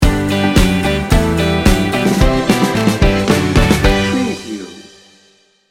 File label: summer breeze sting bumper